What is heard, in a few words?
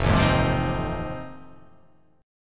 F 7th Sharp 9th